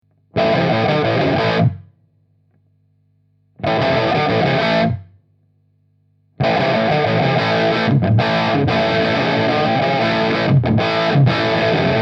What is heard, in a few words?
lead